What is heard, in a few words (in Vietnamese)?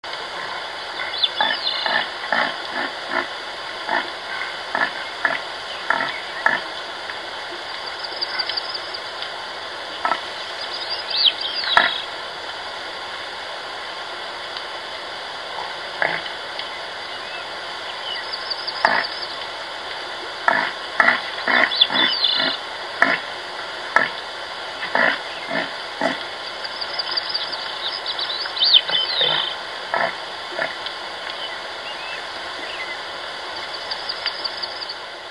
am thanh cua tuan loc o taiga